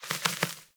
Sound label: Sparrow Three Wing Flaps; Animals